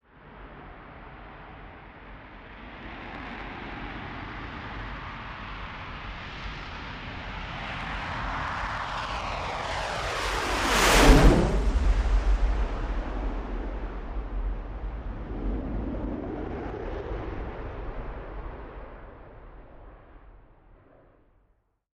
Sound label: AV-8B Harrier takeoff